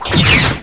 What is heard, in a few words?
fire pulse